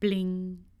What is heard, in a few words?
UI Sounds Bling